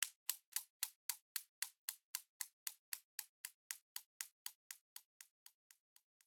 newton's cradle; devices accessories utilities; NS Studios - ultra sound collection

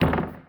Street Ball Glass Back

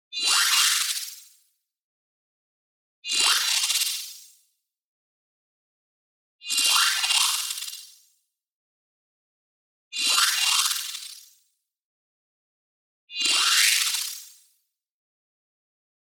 SHIMMER Freq Shift Glass Scrape Pitch Delay; boom library; Anime Essentials; DSGNMisc

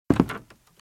Footsteps - Wood - Creaky - Walk - Left; RadwinIsland

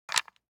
DICE on Wooden Tray, Grab, Standard, 5 Five Dice; Samples; ArticulatedSounds; DICE Sfx Party (Extended)

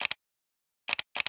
Broken Camera

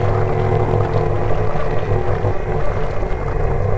Sci-fi Slime Hum Loop; SFX Kit; Sci-Fi Loops

